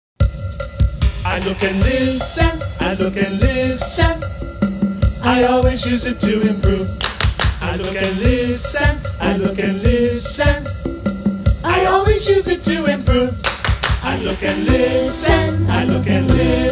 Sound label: Cha Cha Cha